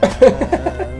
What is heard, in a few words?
laugh